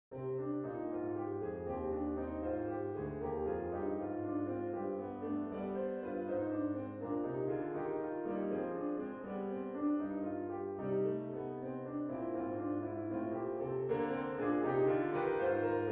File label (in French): Invention à 3 voix